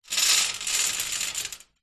industrial chain small movement scrape hoist over metal light room reverb; zapsplat